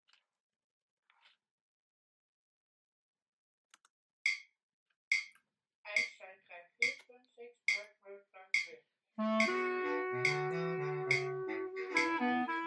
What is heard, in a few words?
melodie; gypsy jazz jam